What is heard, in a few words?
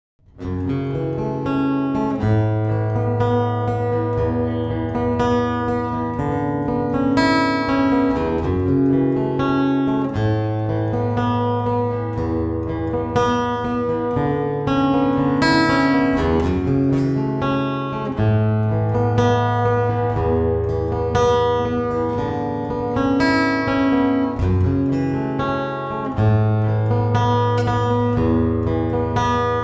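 Acoustic, guitar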